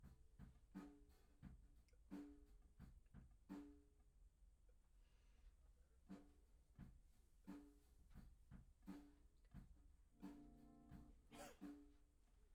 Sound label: Act Guit L